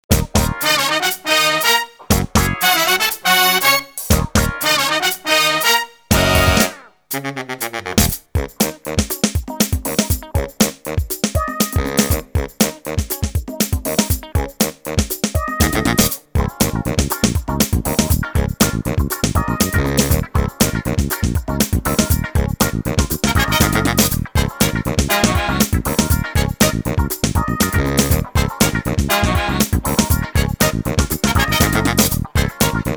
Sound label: Phantom Horns